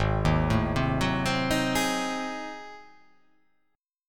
G sharp 7th Flat 9th G sharp down, chord

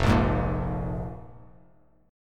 F Minor Double Flat 5th, F, Bass Standard; Fmbb5 strummed; 1,1,6,3

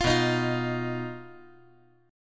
B Major Flat 5th B, chord

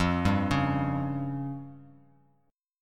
F Suspended 2nd Sharp 5th F down; Fsus2#5 arpeggiated; JGuitar

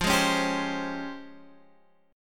C sharp Major Sharp 11th F

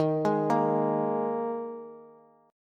Eb Suspended 4th Eb Bass; Ebsus4 arpeggiated; JGuitar

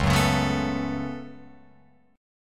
Db 7th Suspended 4th Sharp 5th Db Guitar Open F; JGuitar

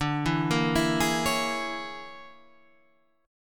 A sharp 7th Sharp 9th Flat 5th D

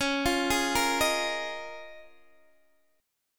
Db 6th Add 9th, Guitar, Open D

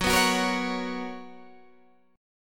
B Major 7th Suspended 2nd F sharp

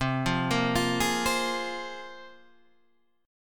C 7th Suspended 4th Sharp 5th, Guitar, Drop D

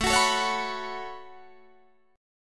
A 6th Add 9th A down Guitar Open D

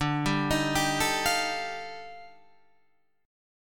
D sharp Major Sharp 11th, D

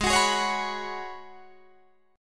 G sharp Minor 11th G sharp Guitar Drop D, chord

